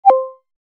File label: haptic shake off; Sharp; AQUOS sense lite SH-M05